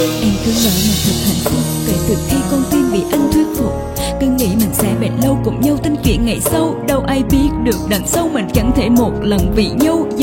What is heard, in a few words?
RAP